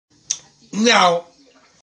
miau loco; rene

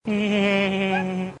risa; peter griffin

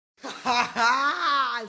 risa

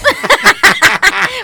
risas

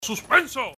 suspenso

